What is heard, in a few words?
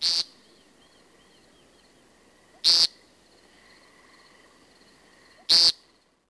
Bird; 特酷声音素材库